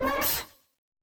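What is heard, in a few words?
attack creature; roblox; coolkidd; princess; slender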